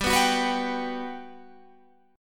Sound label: G Major 7th, G, down, Guitar, Open G